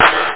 metal claps

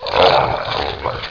Taur Noise; BOOM

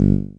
bass G; CLARITY

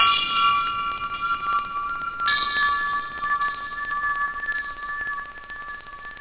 ting; da capo vol1; drums nature

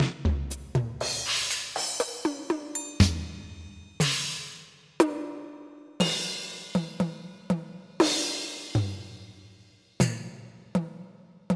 Pop Drums Damp

